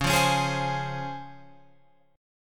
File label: Db Major 7th Db down Guitar Open F; strummed